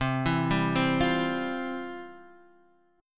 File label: F Major 7th Suspended 2nd, C, guitar